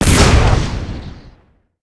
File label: rocket fire